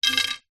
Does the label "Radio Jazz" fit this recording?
No